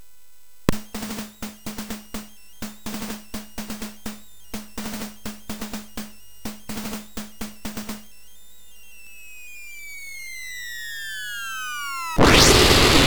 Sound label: Music Demo Version, MOS6581R3; hvsc